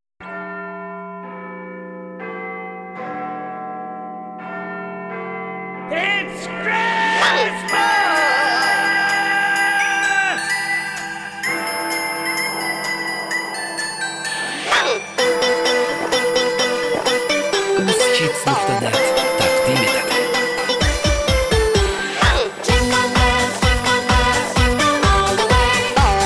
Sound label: jingle bells; yangi yil qoshigi